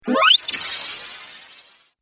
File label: Laser Hiss Video Game